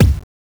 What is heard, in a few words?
kick; goodtakimu drumkit; fl studio; drumkits